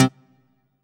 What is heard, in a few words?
HOUSE, House Dance